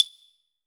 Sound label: clave; Greytsounds; Scott Peers Sound Engineering